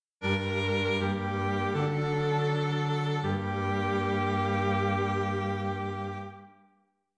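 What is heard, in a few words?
string quartet, dictation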